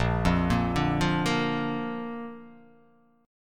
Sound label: A sharp Major